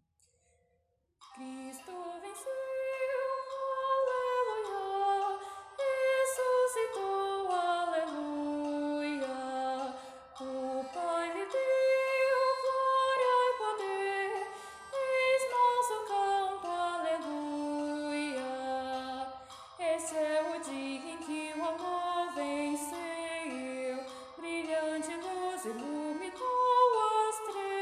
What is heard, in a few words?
soprano